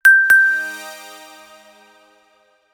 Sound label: NOKIA ALERT; Nokia Ringtones